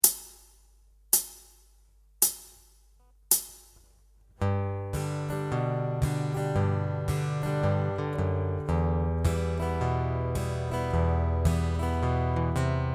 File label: Bass Note Picking